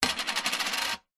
money coin set down on metal surface spin

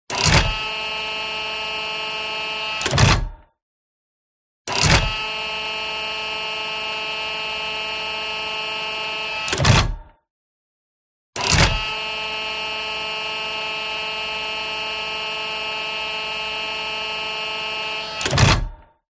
TANK Small Turret Rotate; TRANSPORTATION

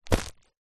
first aid kit pouch fabric grab pick up; household

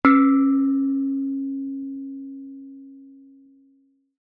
musical instrument bonang gamelan hit soft

